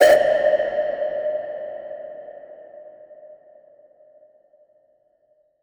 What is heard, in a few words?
Perc